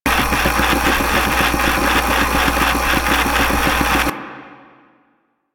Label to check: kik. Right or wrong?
Wrong